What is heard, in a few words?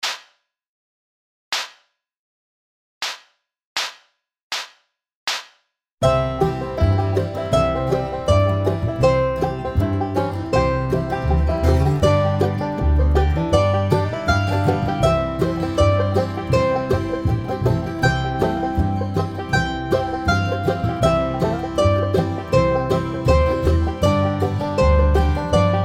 Go Tell Aunt Rhody slower, Mandolin